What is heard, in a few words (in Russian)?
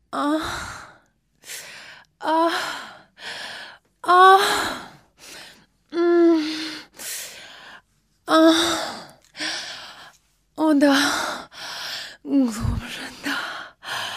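zvuk stona devushki, girl moaning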